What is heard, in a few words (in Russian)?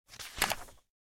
клик мышки с переворачиванием листа